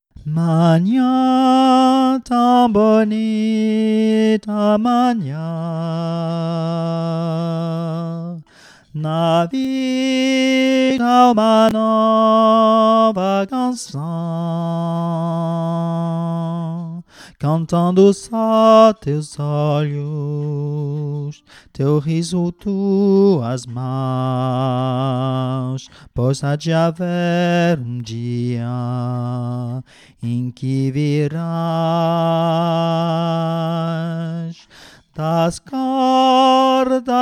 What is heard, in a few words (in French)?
soprano VOIX